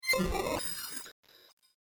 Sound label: Scrambled Radio Signal Tuning Static; Scifi Technology; Noise; Electronics